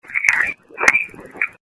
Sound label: sings; Salem